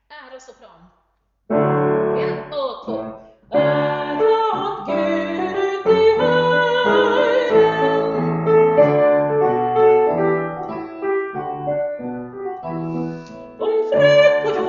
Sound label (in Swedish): sopran